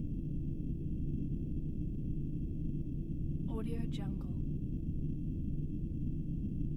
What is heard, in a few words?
retro game dark ambience loop